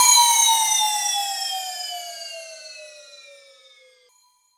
Drum Crash Sound B Key, Royality free drum crash sound tuned to the B note. Loudest frequency: 5415Hz; cymbal drum samples